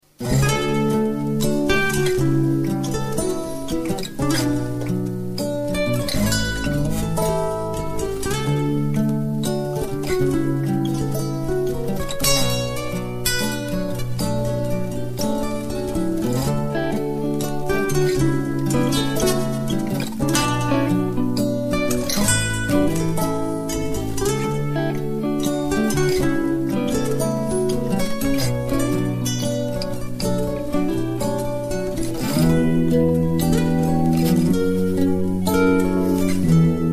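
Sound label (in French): Un solo ... à nous de construire l'accompagnement. Je suis parti en acoustique avec mon Ovation Elite et j'ai swingé; Backing track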